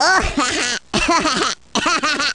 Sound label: baby laugh; baby luigi